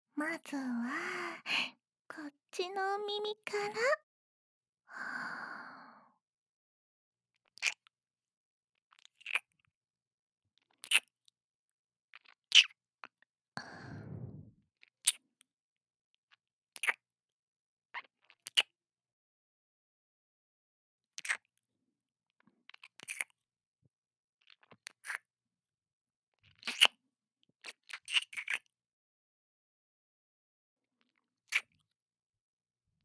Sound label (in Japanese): 元気耳舐め, ASMR